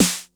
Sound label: 909 SNAPPY, TR-909 DRUMS